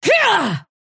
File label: effort melee big